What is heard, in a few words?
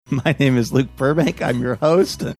giggling; saying my name is; im your host